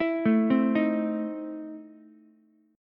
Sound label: E Major 7th Suspended 4th Sharp 5th Ukulele; EM7sus4#5 arpeggiated